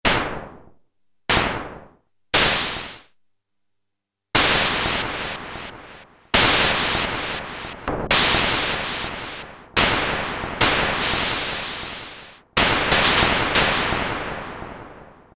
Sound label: Gunshots, Prophet-5; Sequential Circuits Prophet-5 (Ver.3); Factory Patch; File 3 Programs